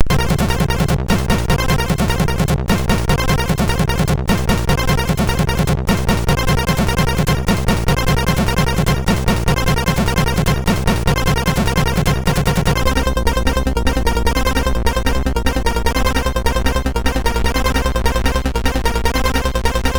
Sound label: MOS6581R2, sid; Sphera